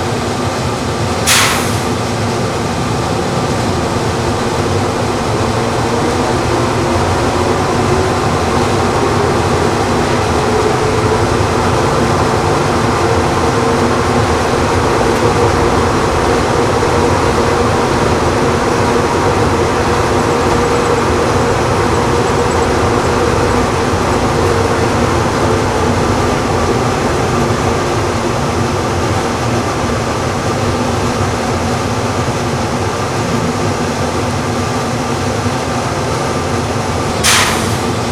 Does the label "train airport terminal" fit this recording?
Yes